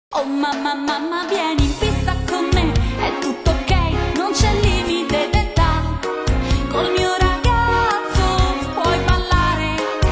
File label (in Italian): cha cha cha